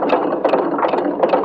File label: MACHINE